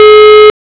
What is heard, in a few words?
busy; HTC; Cingular-8525-WM6